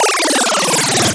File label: 8bit drop